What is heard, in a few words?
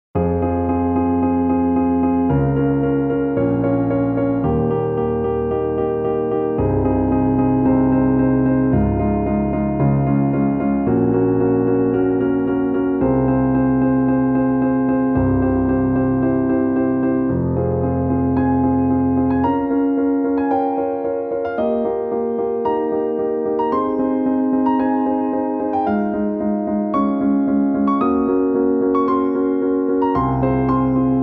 Andante Espressivo; SUCCESSION S4